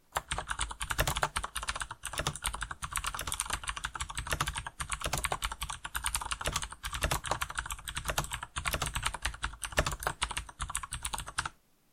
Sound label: Writing